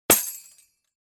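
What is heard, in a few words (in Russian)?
еще удар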